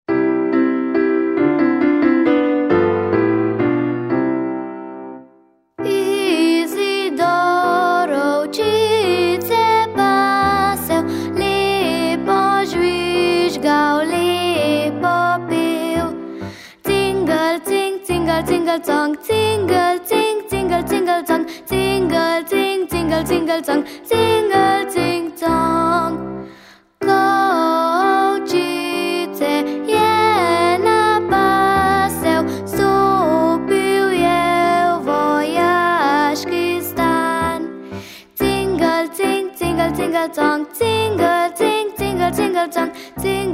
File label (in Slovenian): glas in klavir; Izidor ovčice pasel